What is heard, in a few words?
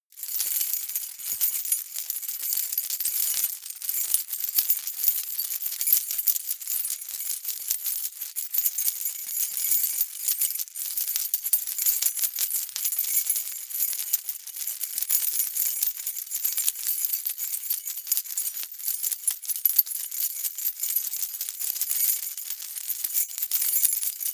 key ring large rattle air sequence hard; objekte; schluessel